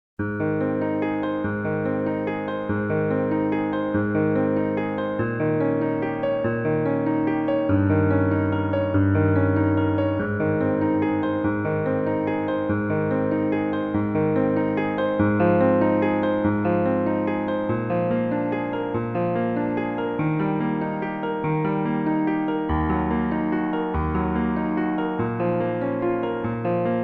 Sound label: auf flugeln des gesanges as-dur high; mendelssohn